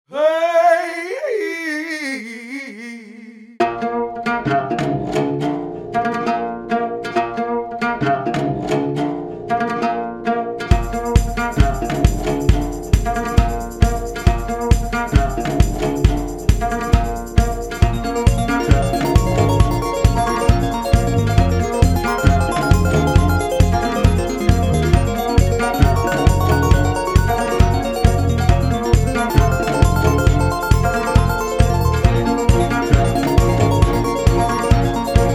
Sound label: exotic tron instrumental